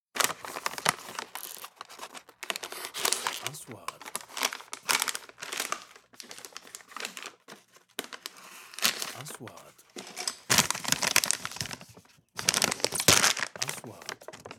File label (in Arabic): صوت علبة معكرونا اندومي; sound effect